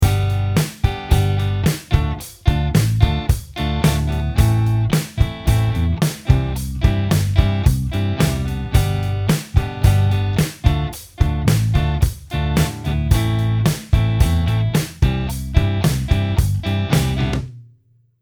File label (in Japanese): ベースライン 5度